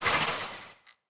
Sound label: slide, breeze; mob; minecraft